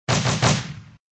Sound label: Shoot Burst; bobtherobber2